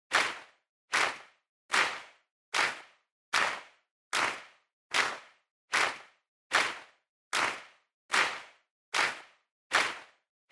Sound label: Handclap, Slow